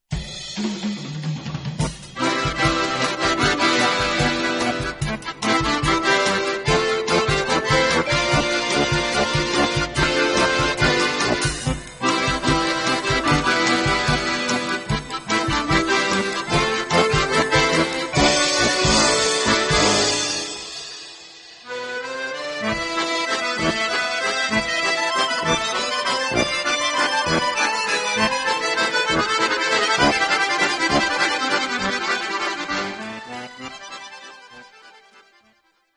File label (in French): mille accordeons